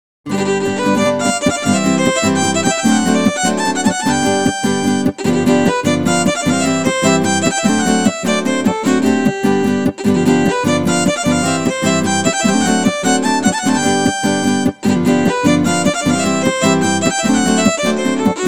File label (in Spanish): Son Huasteco; Son Huasteco Potosino